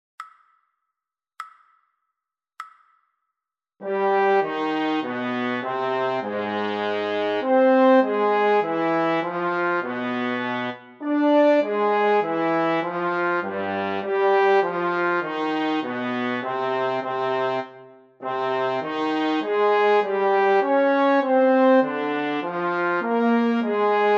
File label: Sarabande trombone